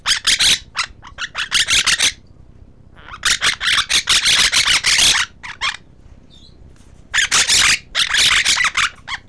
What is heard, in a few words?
yell